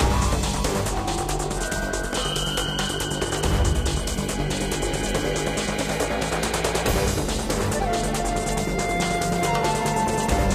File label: Mining Complex Action; James Cameron's Avatar The Game